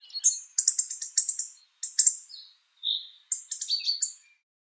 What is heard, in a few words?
Hawfinch calling and preening